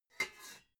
pots clank quiet

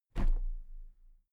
toolbox place upright soft

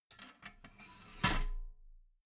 metal ladder small handle fold regular

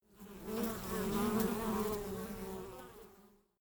bee buzz

